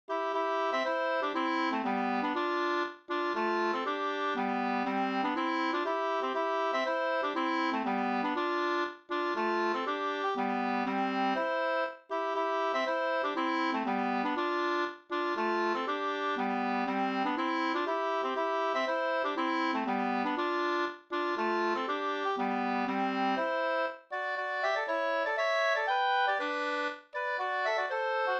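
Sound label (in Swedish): polska, tvåstämmig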